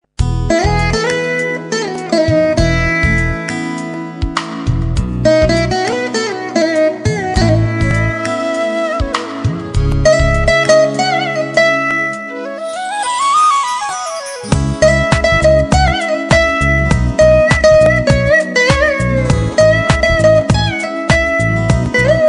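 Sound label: Instrumental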